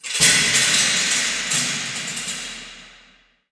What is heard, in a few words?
metal, ambient materials